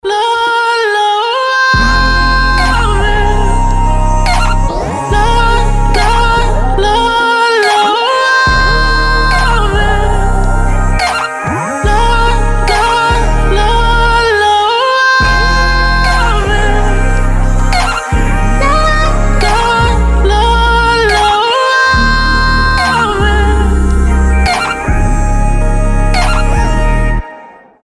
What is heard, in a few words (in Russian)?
ochen chuvstvennaya melodiya